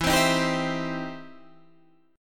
E Minor Major 7th Sharp 5th, E, down, Guitar, Half Step Down